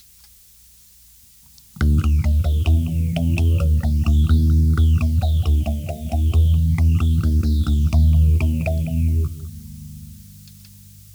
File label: microtonal